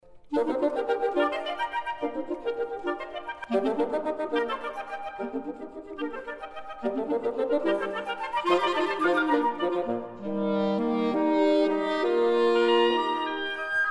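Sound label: wind quartet; goepfart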